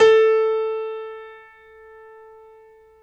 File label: PNO, Grand Pno, Roland; Club-50 - Foundations; Grand Pno 2